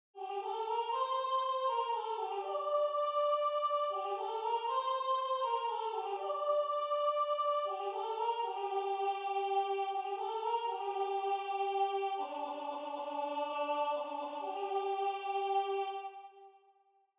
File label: Soprano; La Part à Dieu - Lallement